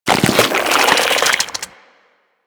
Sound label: Infested Devourer Extend; Warframe